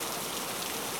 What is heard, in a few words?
rain, weather; ambient; minecraft; TBMPack